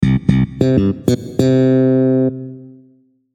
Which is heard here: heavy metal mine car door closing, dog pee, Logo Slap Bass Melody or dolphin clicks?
Logo Slap Bass Melody